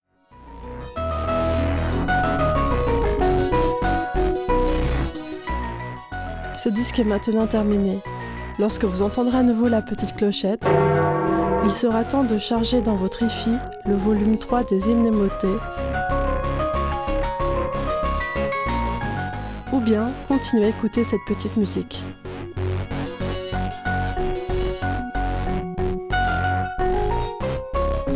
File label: noise audiophile